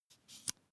meter unfold slide single

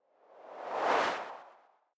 whoosh simple flutter high

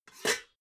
pots clank soft

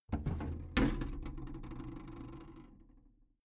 big plastic case lid lift soft

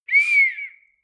whistle police faint

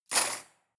cutlery cake forks drawer place hard

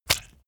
leather impact mud hard squelch

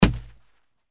football throw

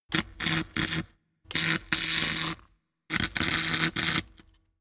spark

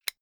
zippo fire close

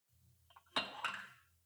weight rack pull out rattle

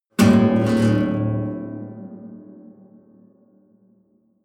low strings buzz sustained

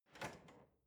drawer large trash draw out

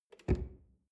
drawer large close lock

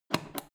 foot switch turn on off quick

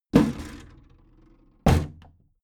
big plastic case lid put down heavy

